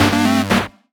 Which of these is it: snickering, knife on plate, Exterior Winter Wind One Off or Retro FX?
Retro FX